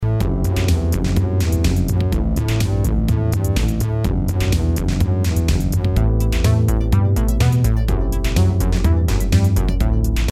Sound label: 80 125 dance